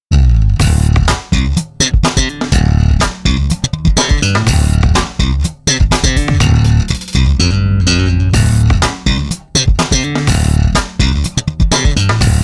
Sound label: me-20b patch; Bright sound, suitable for slap bass.; ME-20B Bank 3, No. 1